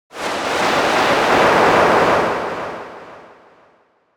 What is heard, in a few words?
sound design white noise descending filter delayed